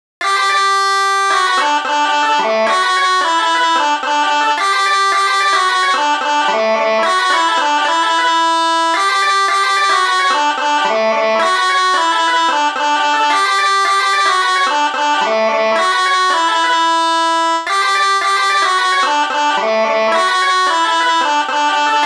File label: Guitalele